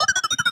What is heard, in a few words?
Toaster